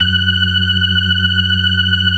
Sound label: BLOOD OCT R, ORGAN